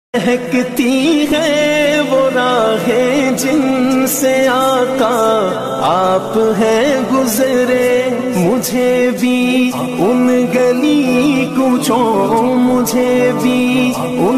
Naat Sharif Ringtone

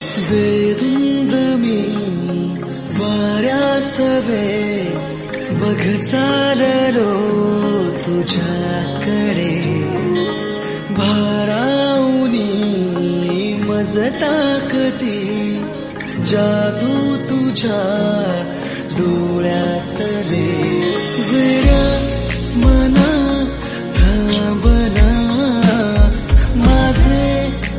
Marathi Ringtone

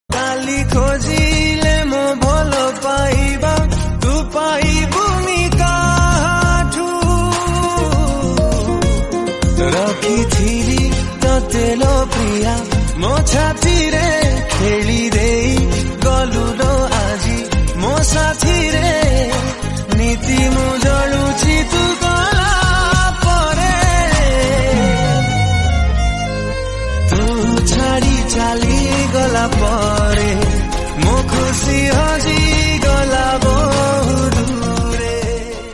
Odia Ringtone

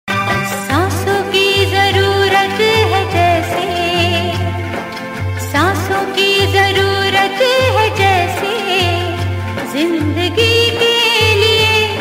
Old Ringtone

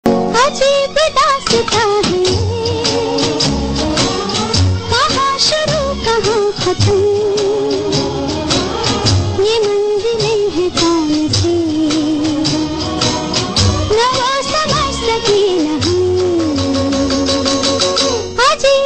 Old Ringtone

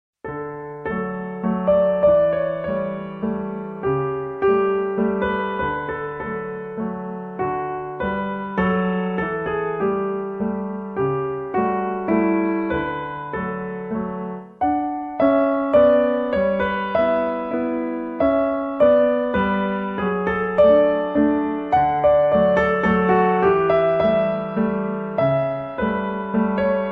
Elevate Alarm Ringtone For Mobile; Santa Lucia

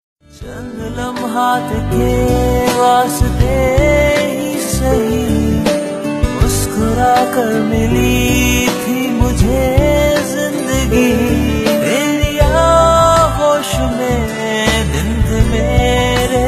Song Ringtone

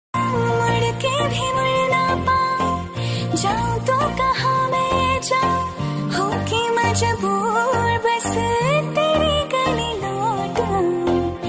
Sad Female Song Ringtone

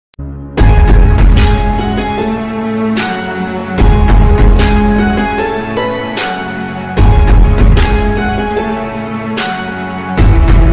Bgm Ringtone

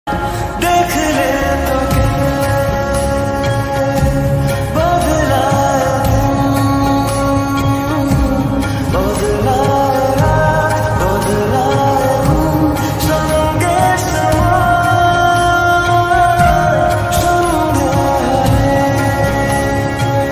Bengali Ringtone

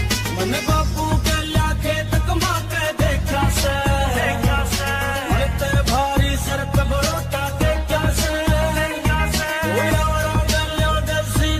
New Haryanvi Song Ringtone

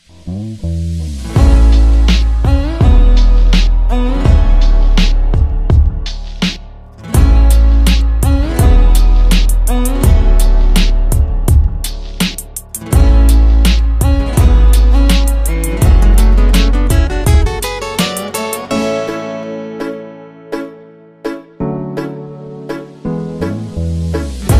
Instrumental Ringtone; ringtones

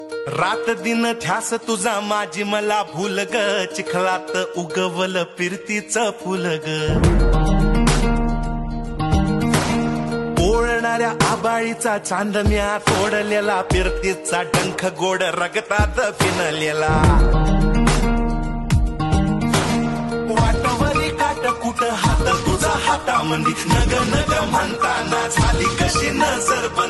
Marathi Serial Ringtone; Jiv Zala Yeda Pisa; Colors Marathi

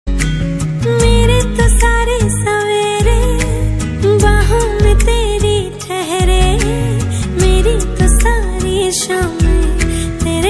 Hindi Song Ringtone

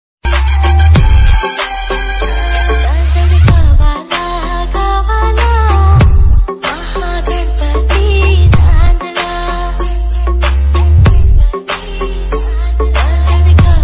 Ganpati Bappa Bhajan Song Ringtone

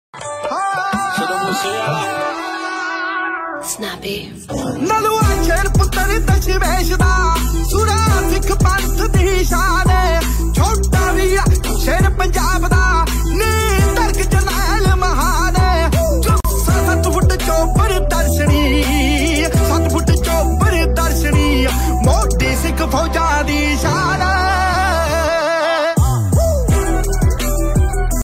Punjabi Ringtone

